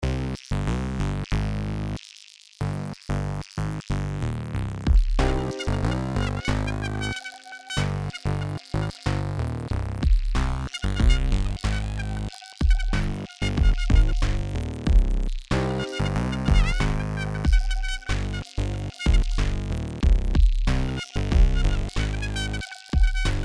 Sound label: Beat